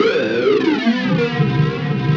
C3, SynthLine; 90 s Sample CDs; NiceBeats; SynthLine VOL-2

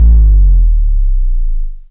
bass sub; Ueberschall; Techno Trance Essentials

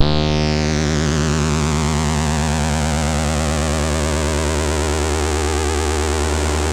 Synth and Organ; InVision Interactive; Keith Emerson; The Most Dangerous Synth and Organ

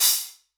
Sparkle Steam Engine Open, Hats; Airwindows

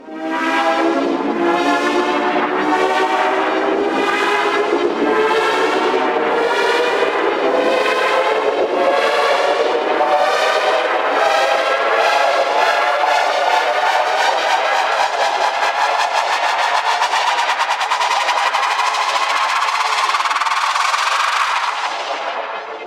Riser; neuro; InsideInfo

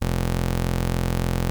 Prophet 5 F2, Synthesizer; Hollywood Edge - Giorgio Moroder Rare Synthesizer Collection

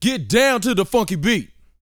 VOCAL F; ILIO

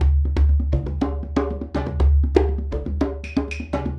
Combo, Essential Percussion

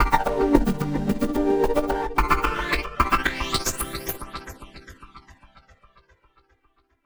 Techno Echo Arp, Arpeggios & Sequences; Dr. Fink's Funk Factory

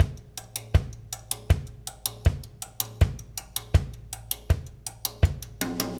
Russ Loop, Laidback; Sonic Foundry (Sony Creative Software); Loops & Samples Drum Tools

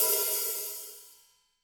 ROCK KIT, Real Drum Kit

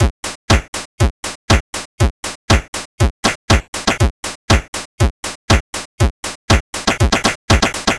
C64 Beat, chiptune drums; Commodore 64

